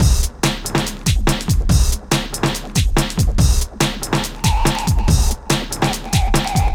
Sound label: Method Machine, 142 Cmin, Hardcore Breakbeat; Big Fish Audio - Hardcore Breakbeat Action